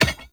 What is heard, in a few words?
Orb Cannon Drop Impact, Powers